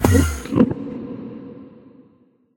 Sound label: portal, endermen